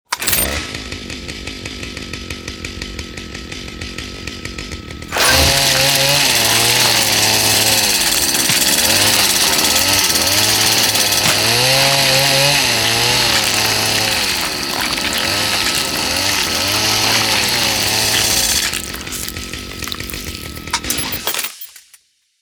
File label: Chainsword; Warhammer 40000; Tabletop; munitorum Volume I Sound Effects